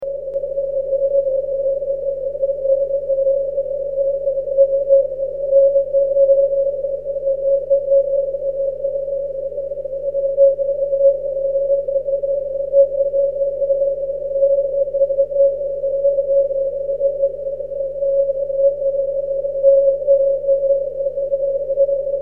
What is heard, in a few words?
cw